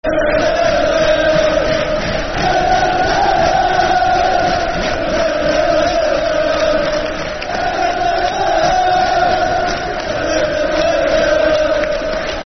Football Chants (Soccer Songs), Cardiff City

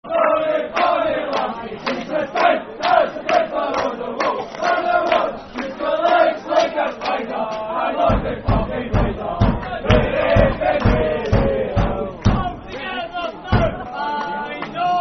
Football Chant (Soccer Song); I Know A Lassie; Partick Thistle Ptfc

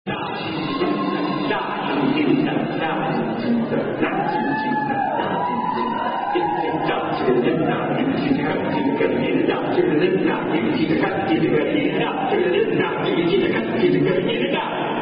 Aussie Aussie Oy Oy Oy Chant; Yoda Chant 2007

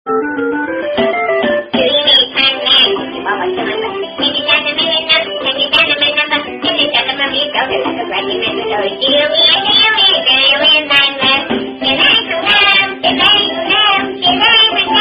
Funny Song, Do Your Ears Hang Low

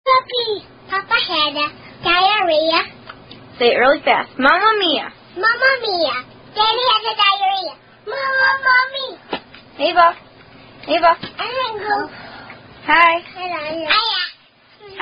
Diarrhea Chant; Mama Mia Papa Pia; Momma Mia Poppa Pee-A

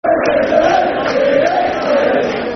Gary Roberts Is A Red Football Chant; Crewe Alexandra Cafc

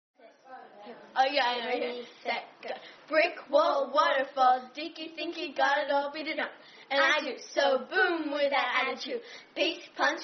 Clapping, Brick Wall Waterfall

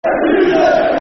Football Chants (Soccer Songs); Hughesy, Hughesy; Blackburn Rovers Brfc

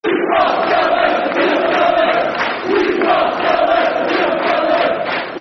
We Are, Football Chant (Soccer Song); Sunderland; Sunderland Safc

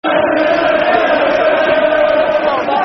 Football Chants; Liverpool Lfc

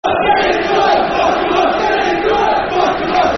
Football Chants (Soccer Songs), Cardiff City

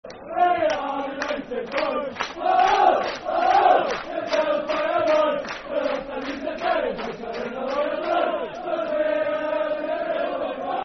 Hello Hello Football Chant Soccer Songs; Dundee United Dufc

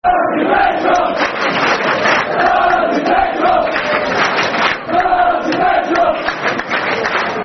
Football Chants, Man City; Man City Mcfc

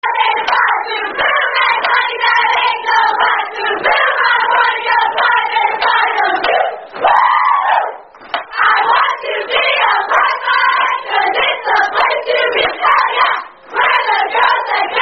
Boom Boom Chant, Sorority Song; Pi Phi; Pi Beta Phi

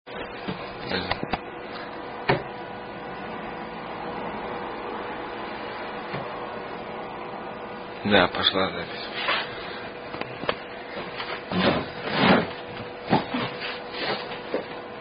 Irish drinking song; Beer Picnic; Wild Rover With Variant Verse

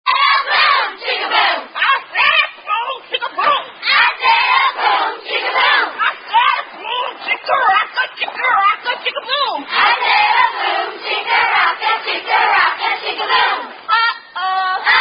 Boom Chicka Boom, Children's Song; Related To Korean Bunny Non-Drinking Game

